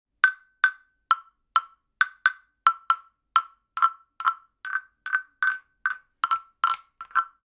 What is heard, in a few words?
Wooden Fish